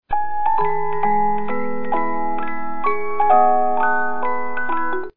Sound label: Minuet in G Major, music boxes, 50-Note Movements